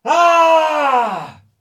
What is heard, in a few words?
Man Scream